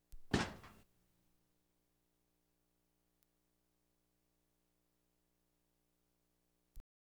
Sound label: door refrigerator close